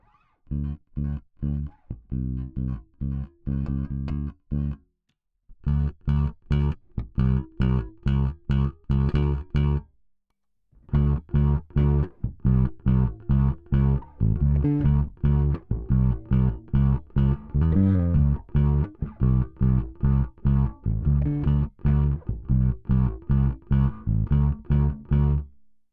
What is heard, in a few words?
woodruff g3 neck lowgain; woodruff v1-1; woodruff g3 neck lowgain schmutzki